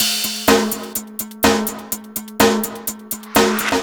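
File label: Industrial Waltz No bass drum, Drum Loops 125bpm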